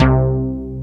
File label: MOOG; OLD SYNTHS; Rubber Chicken Software Sample Bank